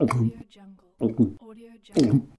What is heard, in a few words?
cartoon character gulping